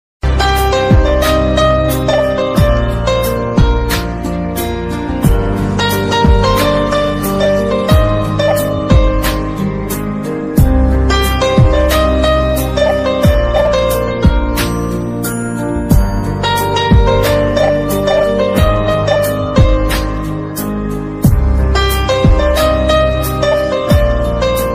romantic love story ringtone, hindi ringtone

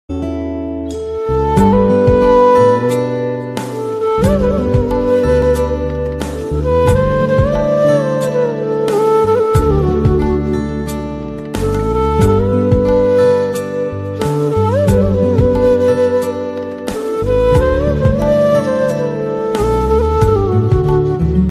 flute instrumental ringtone call ringtone

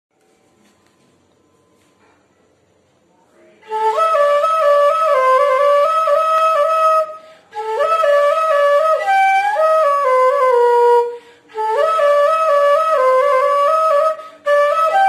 bgm flute cover

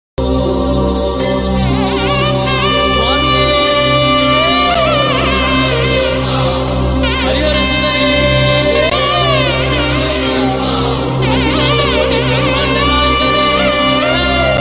ayyappa swamy ringtone telugu

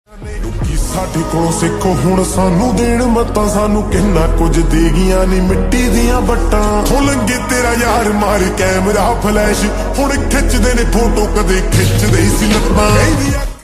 punjabi song slowed reverb lofi ringtone

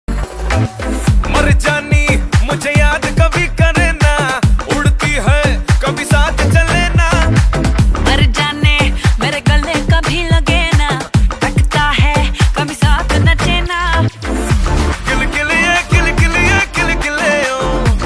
south song hindi ringtone; ntr; devara

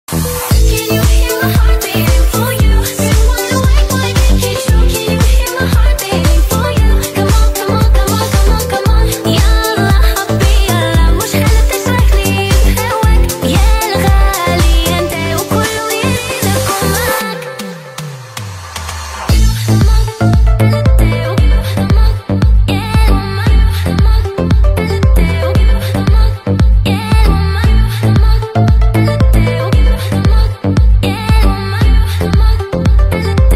ringtone english ringtone

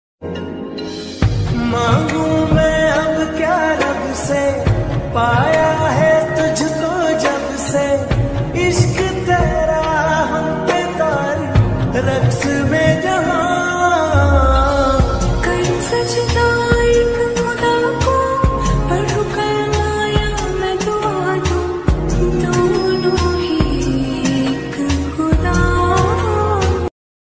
ringtone love music bgm

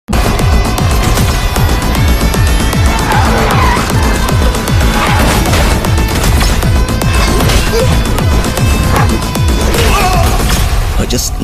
climax bgm intro malayalam movie bgm; mammootty; turbo ringtone

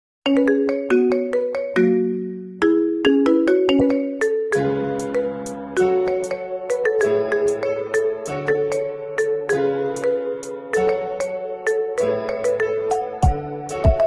iphone ringtone remix

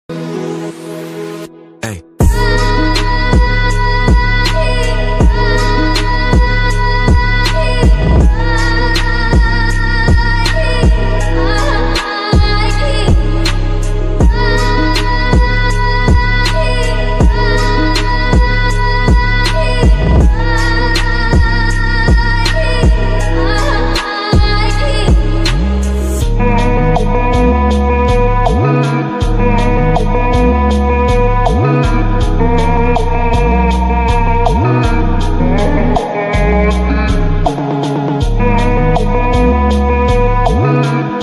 english ringtone bgm ringtone

